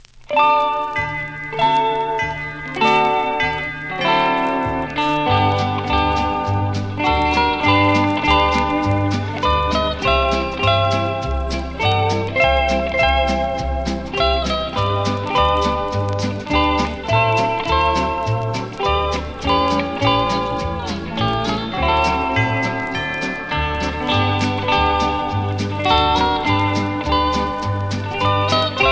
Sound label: bells, banjo